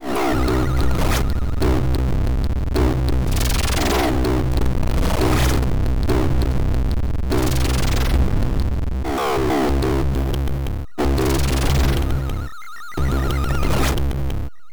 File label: UFO Drone; bik a space adventure 2014